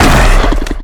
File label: giant make hit; mutants; STALKER; customnpcs